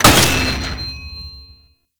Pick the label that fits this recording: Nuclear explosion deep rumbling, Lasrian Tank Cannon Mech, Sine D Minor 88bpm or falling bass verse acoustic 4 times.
Lasrian Tank Cannon Mech